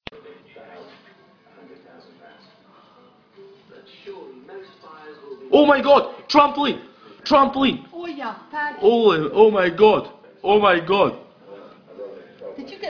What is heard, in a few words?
Trampoline